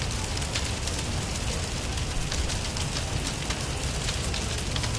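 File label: forge loop; smite; vulcan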